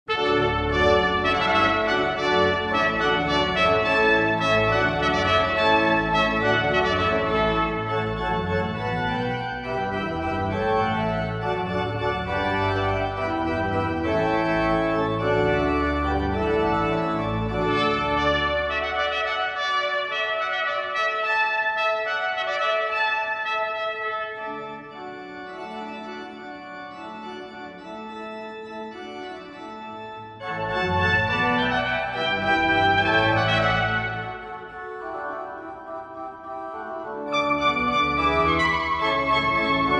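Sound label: hornpipe trumpet organ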